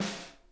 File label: VINT KITS; Sonic Reality - Vintage Timetraveler